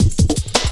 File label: static cut, drum loops; Zero-G Jungle Warfare 1, 2 & 3